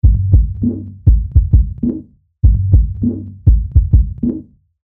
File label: Loop - Big Kickin'; Best Of N2O Library